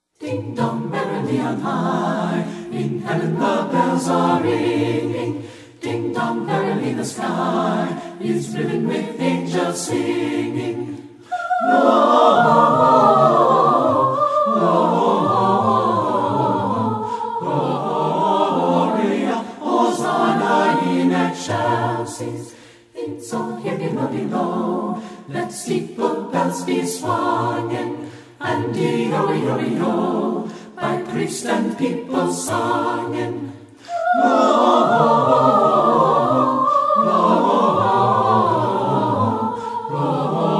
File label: Madrigal Singers, Ding Dong Merrily on High